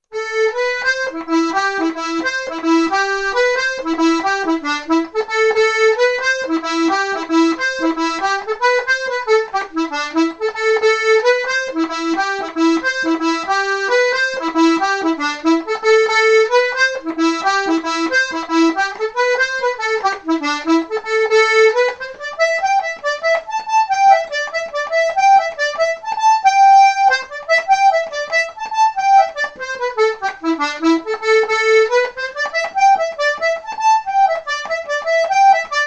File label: accordion; geese in the bog